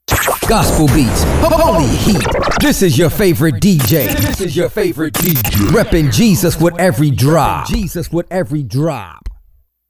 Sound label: Gospel Beats Holy Heat